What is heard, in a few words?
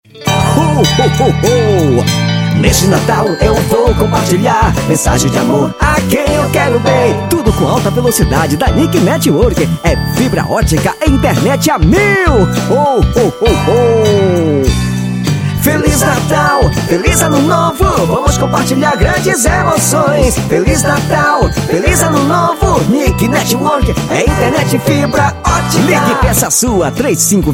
Jingle; jingles natalinos